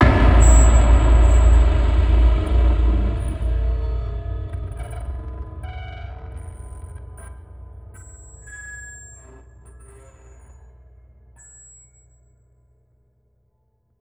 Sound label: Glitchy Tail, Cinematic Impacts; 99Sounds; Rumore